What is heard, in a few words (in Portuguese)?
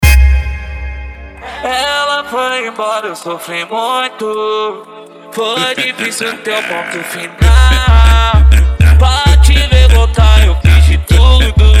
esp de cavalgada